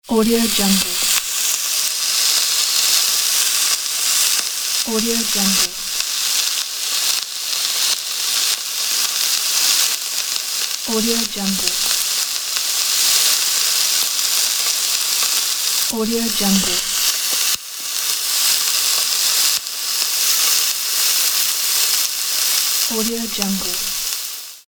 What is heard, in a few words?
sizzling meat